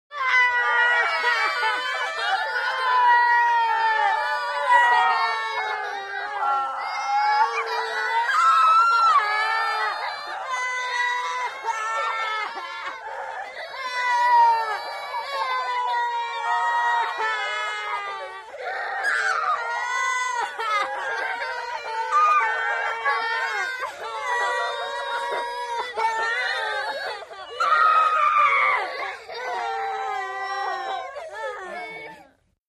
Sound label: 5 Kids Crying